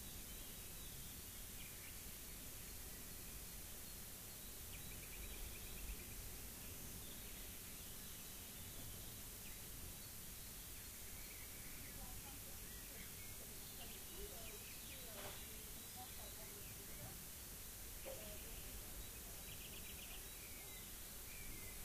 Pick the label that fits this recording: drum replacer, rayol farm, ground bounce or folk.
rayol farm